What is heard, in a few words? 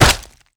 Bullet hit body, bullet impact flesh; Doom